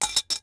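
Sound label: metal drop Concrete small; objects; Star Wars Obi-Wan; XBOX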